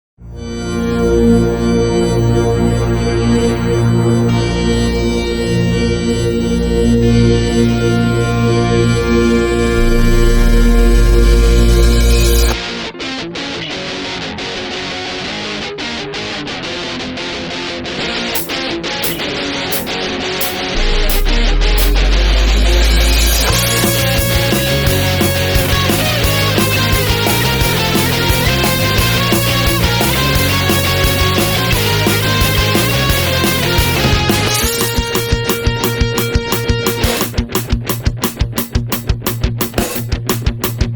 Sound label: Eurobeat, Instrumental